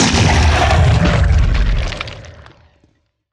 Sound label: worm death big